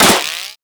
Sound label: Electro Mag Reload A, Corpus weapons; Warframe